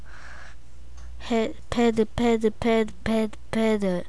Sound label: pad